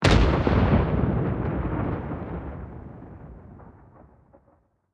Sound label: plane; World of Tanks